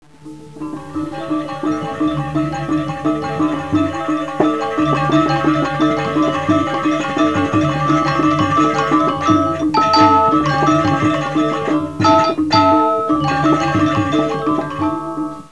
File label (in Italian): suonerie musica ritmica